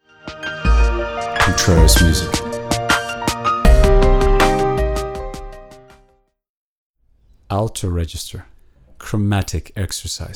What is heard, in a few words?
alto chromatic exercise